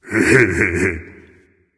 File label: heh heh, voice; Smite; ymir skin12